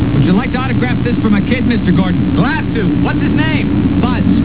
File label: buzz; "Would you mind autographing this for my kid, Mr Gordon?" - pilot